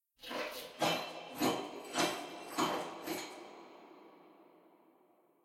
anim walk window look out

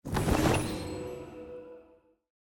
fanfair; tent

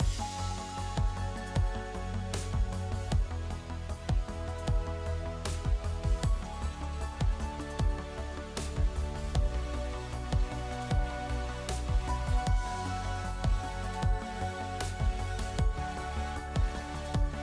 music blind pick; starguardian

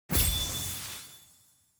sfx honor teammate receive

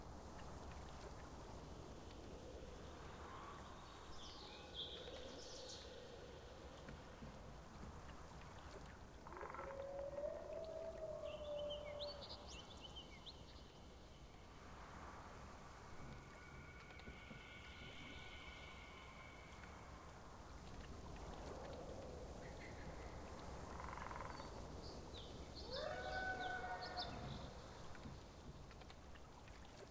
ambience loop; tft set18

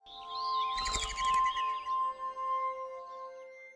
intro spirit forest; lol

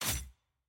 aah; evelynn

